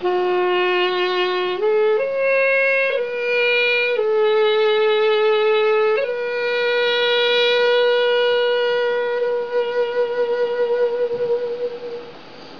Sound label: guanzi